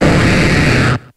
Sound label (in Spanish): Grito de Gyarados